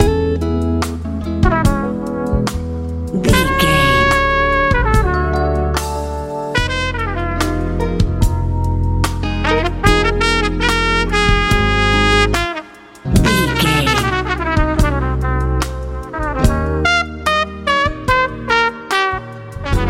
funk

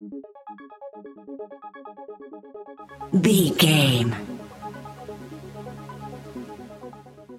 sci fi pack stinger

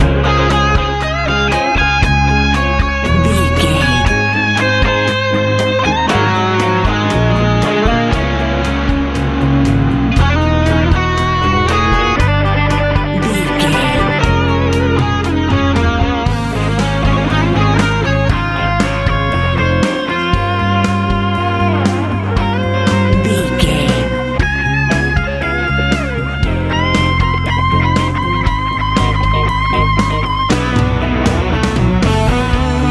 fun easy rock

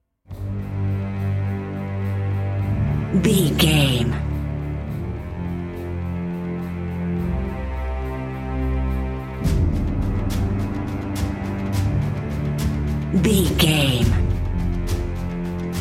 epic cinematic dnb drum and bass mix

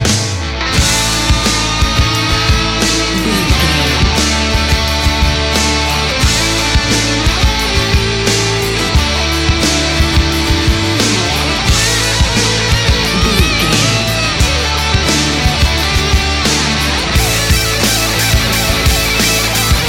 boys band

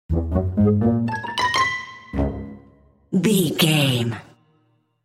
cartoon

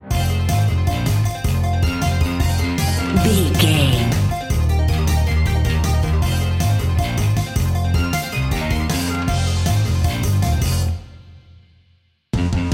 fast 80s synth rock

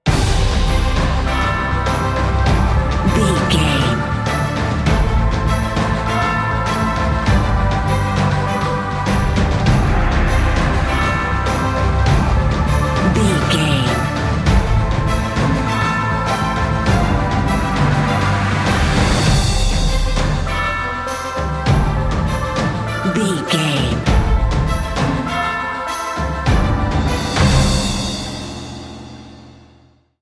epic adventure

